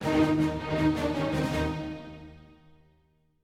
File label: Music Fight Cold Theme Easy 160bpm End; asterix obelix slap them all 2